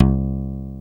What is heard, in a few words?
PC-BASS LO